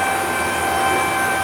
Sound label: tank gunner move